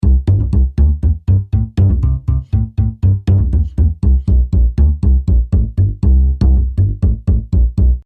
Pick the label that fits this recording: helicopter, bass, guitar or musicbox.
bass